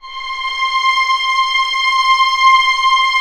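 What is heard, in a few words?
STR C5 PP, Orchestra Strings; Sound On Sound - Peter Siedleczek's Orchestra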